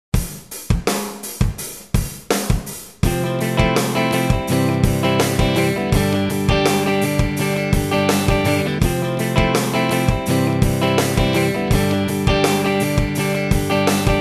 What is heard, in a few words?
Rhythm Guitar